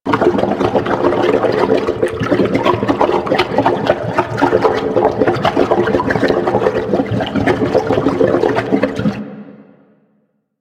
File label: Ocean Cloning Gurgle Loop; Ambience; Props; Warframe; Grineer Ocean